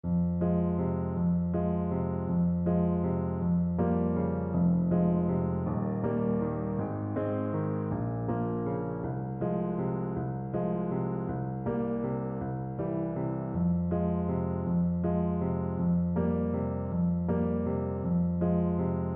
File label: Trumpet; mendelssohn op19 6